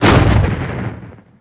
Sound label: impact meteor fall